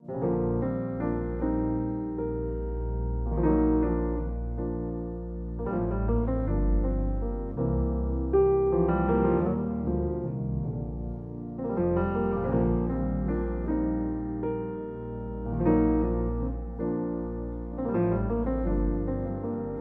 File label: Solo Piano